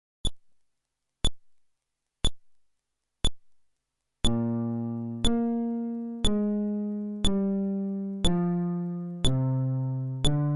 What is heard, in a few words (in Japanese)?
フレットレス